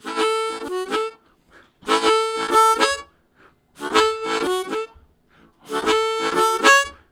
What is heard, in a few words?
Lamb With Tail, Harmonica Riffs; Zero G